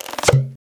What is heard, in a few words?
Stretch & Pop; Ed Edd n Eddy; Adromulus Pack